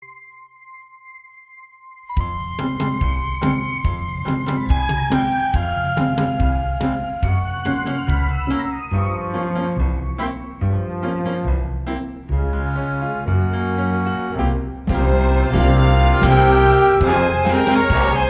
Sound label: accomp